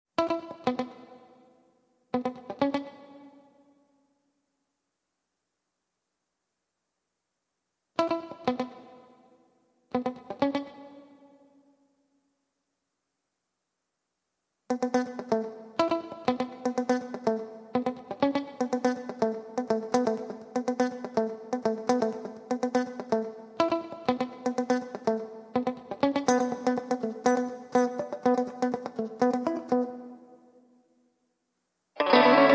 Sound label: Electric Guitars